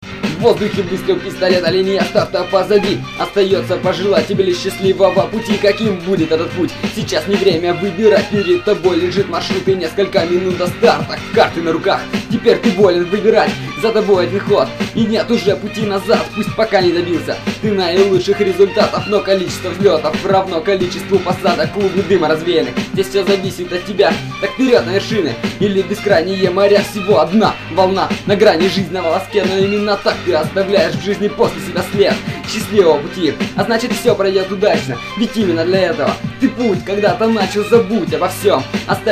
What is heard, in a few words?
riff